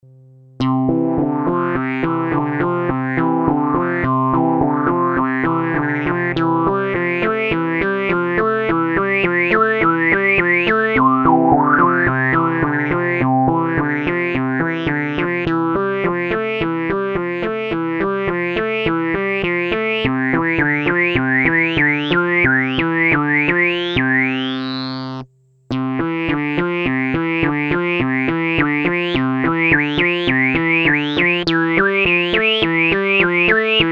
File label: analogize Qtron